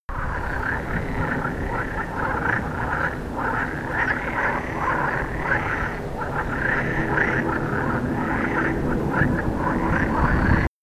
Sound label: Marsh Frog